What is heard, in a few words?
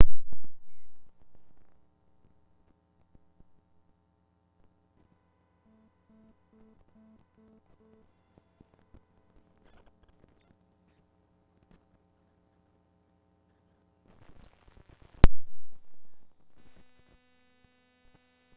Audigy 2ZS distorted output